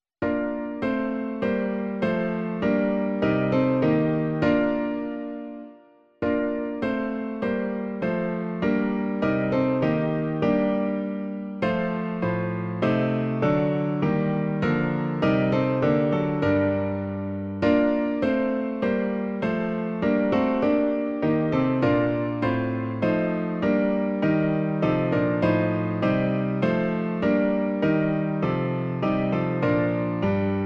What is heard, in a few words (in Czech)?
Hospodine, pomiluj nás - klavír; Klavírní verze